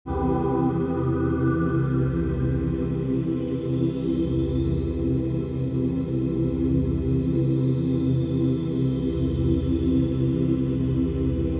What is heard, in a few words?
drones